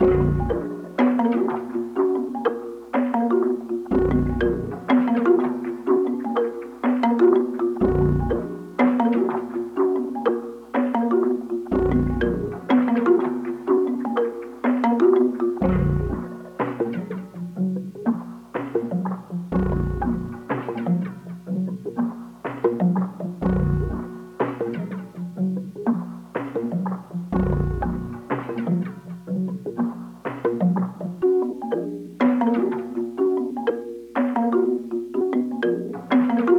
123 BPM A# Min, Melody; Cymatics; 2022 Melody Collection Platinum Expansion

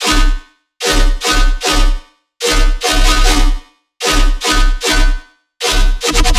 Bass Loop C 150BPM; Dubstep; Vortex; Ghosthack; Advent Calendar 2022

